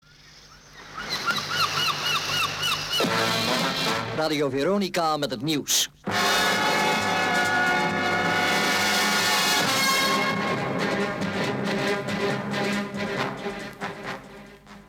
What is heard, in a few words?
news intro; Radio Veronica